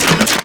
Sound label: weapon pickup; serious sam 2